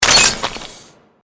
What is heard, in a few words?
Created Magic Spell Ice Bolt Impact; Series of created spell sounds